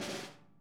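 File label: snare buzz; Sonic Reality; Interactive Drum Kits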